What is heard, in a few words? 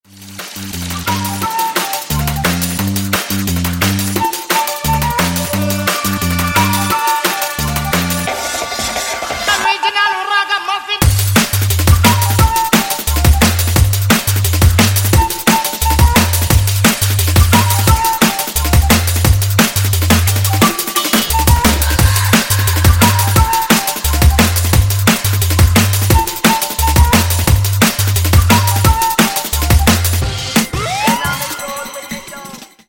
instrumental mix